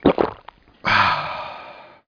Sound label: gulp; outlaws